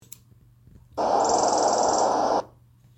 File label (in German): waldlaubsänger